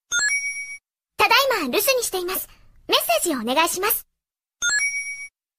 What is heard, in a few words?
japanese tone; ringtones; sms